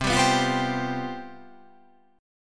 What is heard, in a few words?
C Major 7th Suspended 4th